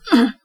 Weird Grunt

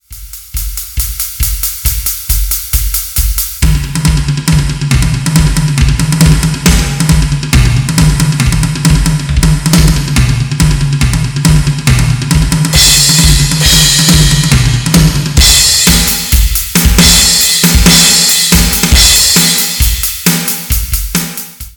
Drum Solo

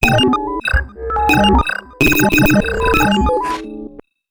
Futuretro computing

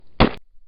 Squishy Splat

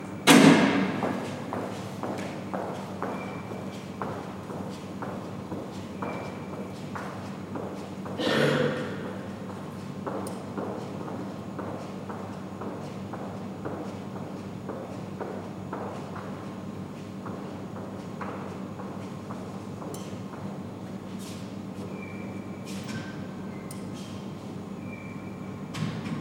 High Heels In Hall

